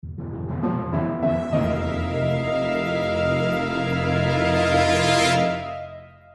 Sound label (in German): spannende musik